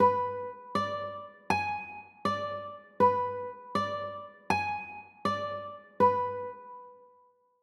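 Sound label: G sharp dim chord, guitar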